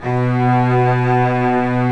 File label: Vcs2 f vb; String Master Series